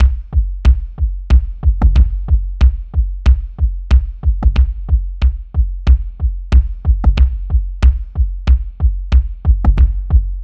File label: Succession 92 Kicks, Ambient Piano Loops; C and Dm Night Mood